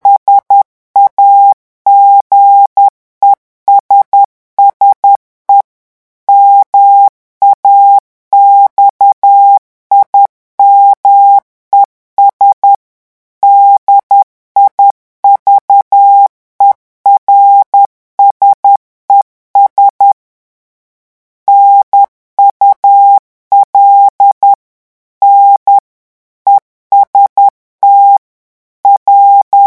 revision francais 10 mots minute, morse; REVISON MOTS EN FRANCAIS - vitesse 10 mots minutes

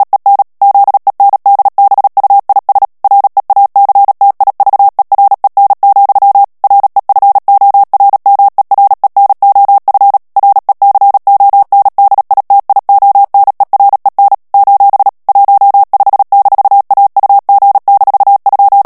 HOLLANDAIS texte clair vitesse 35 mots minute, morse

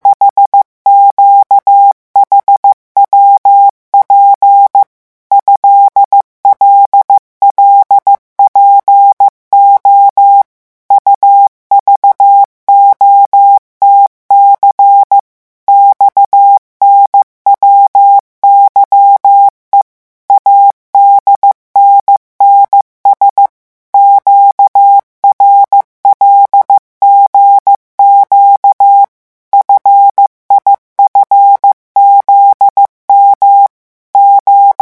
lettres vitesse 14, morse

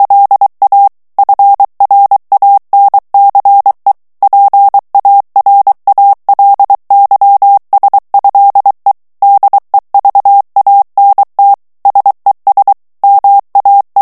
FRANCAIS TEXTE CLAIR VITESSE 22 MOTS MINUTES, morse

